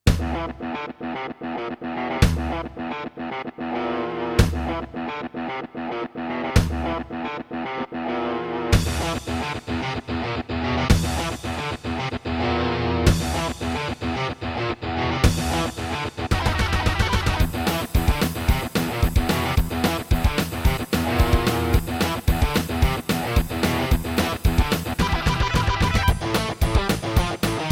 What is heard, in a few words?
Roland SC-88; Doom